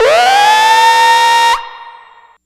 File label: Arp Whine, SFX, Electronic; Sonic Foundry (Sony Creative Software); Electronic Point Blank